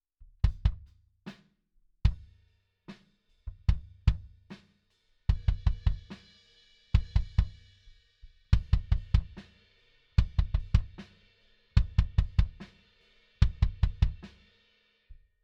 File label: Kick